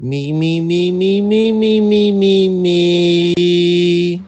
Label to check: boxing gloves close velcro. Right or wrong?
Wrong